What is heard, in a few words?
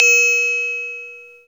GLOCKENSPIEL; Greytsounds; Scott Peers Sound Engineering